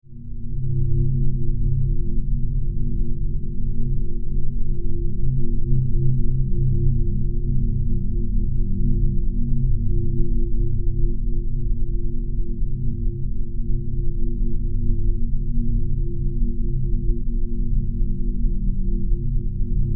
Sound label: Science Fiction Alien Power Drone Modulation